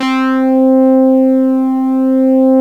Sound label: Bass & Lead; GMPlay; Synth lead